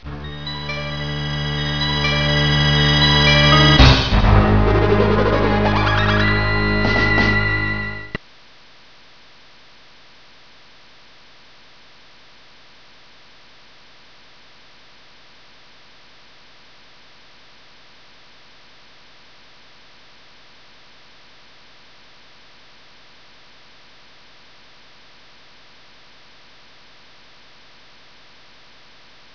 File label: music; planet xen